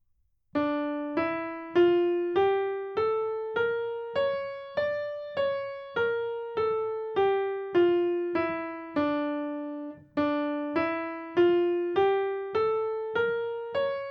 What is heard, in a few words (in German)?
Harmonische d-Moll Tonleiter Übung